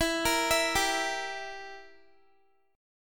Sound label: E Diminished down Guitar; arpeggiated